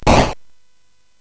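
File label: Nebulus, MOS 8580 SID chip music, games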